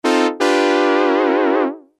lfo mono; nord3

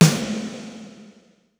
big balad; sr16 sd

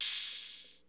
open hihat, tr808

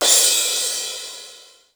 lo crash; sr16

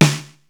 sd, rx5

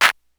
claps

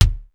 md stomp; sr16 bd

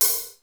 thin hat; sr16

